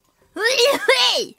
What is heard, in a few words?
uheeeheeey